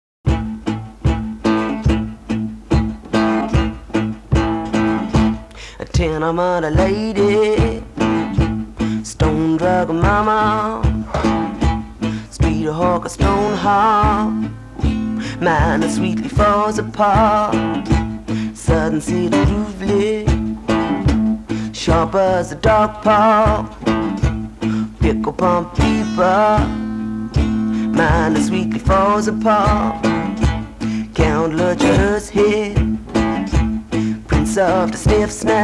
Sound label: acoustic and bass